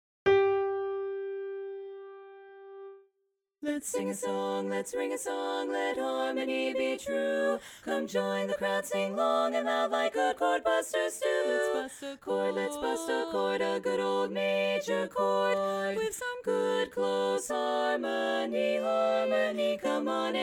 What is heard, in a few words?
tenor dominant, chorus